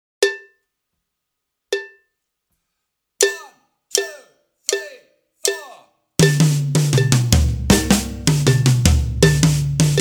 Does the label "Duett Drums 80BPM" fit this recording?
Yes